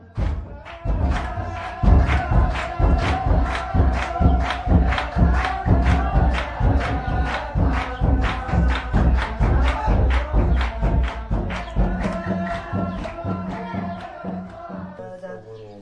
christian monk chanting; MALE MISC and SPOKEN WORD

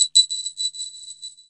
echoing tinkle hit

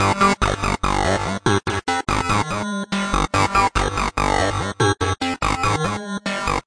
144 crunchy synthline

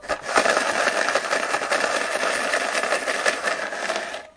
can of peanuts shaker; Weird and Interesting Hits; single hits

